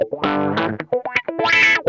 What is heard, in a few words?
ROCKIN, Funk Guitar; 90 s Sample CDs; Zero G